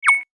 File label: tweet; litechatplus